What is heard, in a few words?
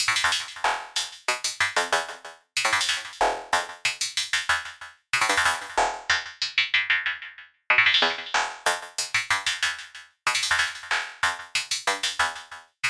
crossmod sawsin; Crash noise division; EMX1 CrossMod SawSin Stab